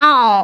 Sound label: goblin miss